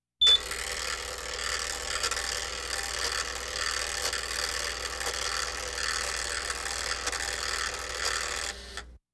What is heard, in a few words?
Hospital effects